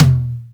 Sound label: Tom Bongy, 909 Toms; Slate; Vintage Drum Machines Volume 3 909 Edition